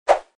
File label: Sfx Enemy Skeleton Attack; Mighty Knight 2